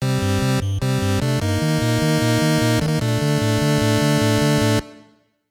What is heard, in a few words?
Match Lose; Punch-Out; Wii